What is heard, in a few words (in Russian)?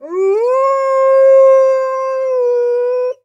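вой голодного волка на луну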